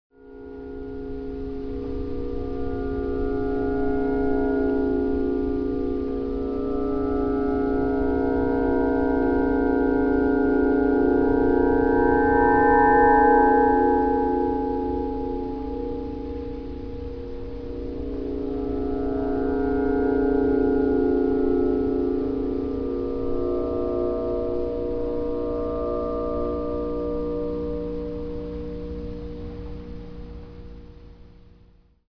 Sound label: doorharp